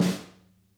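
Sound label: snare, Interactive Drum Kits; Sonic Reality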